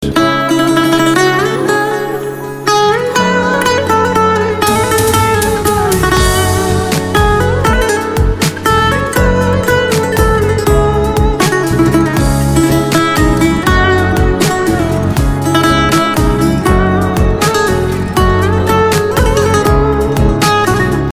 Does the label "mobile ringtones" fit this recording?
Yes